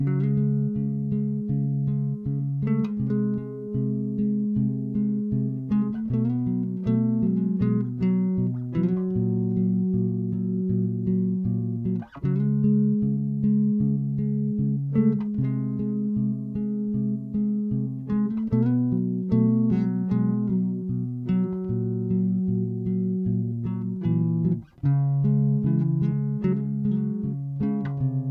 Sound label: fall